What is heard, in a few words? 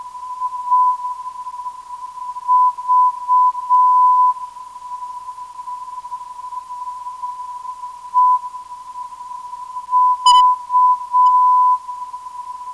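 non-directional beacon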